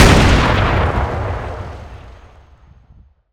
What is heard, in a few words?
m67 explode; garrysmod